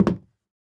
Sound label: Footsteps Sneaker Wood Walk; Infernal Radiation